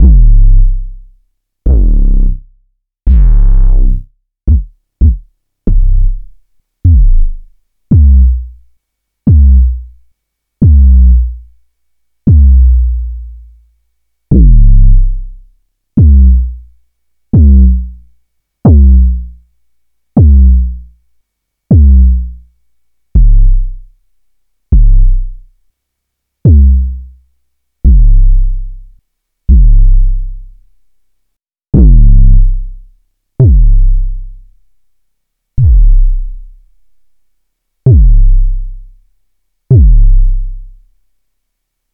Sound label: Kick Comp and EQ